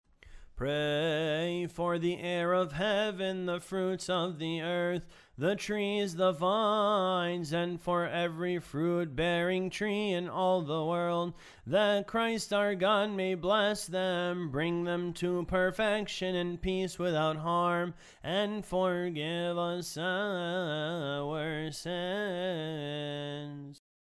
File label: litany of the air, hymns